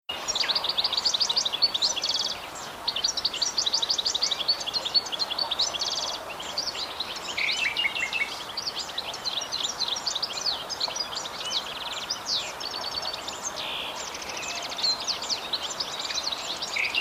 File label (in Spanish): Jilguero europeo - Carduelis carduelis parva; ocells